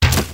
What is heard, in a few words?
arrow Fired Sound; Soulforge Tower Defence